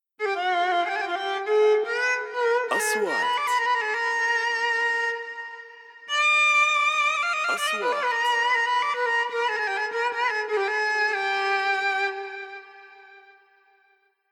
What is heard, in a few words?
intro VIOLIN; sound track